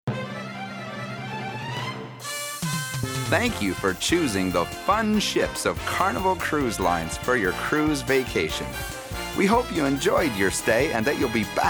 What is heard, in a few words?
Commercial